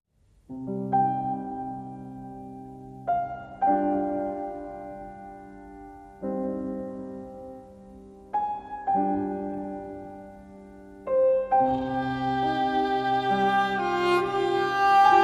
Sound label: Piano & Violin